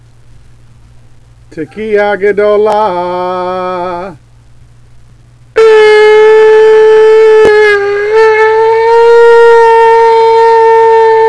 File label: tekiah gedolah